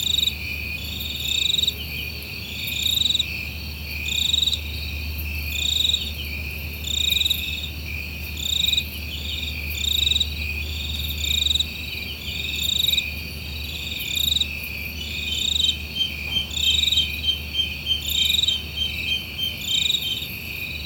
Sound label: Forest Night Frogs; Rainforest